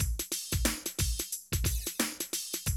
Classic DrumLoops

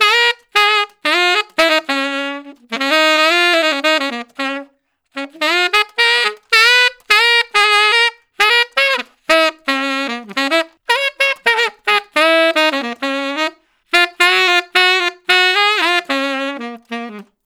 Tenor Solo, Jazz, Traditional, Tenor Sax

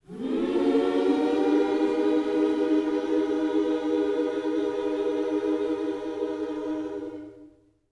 MM MINOR, Gospel; Spectrasonics; Vocal Planet; MIN. SCOOPS

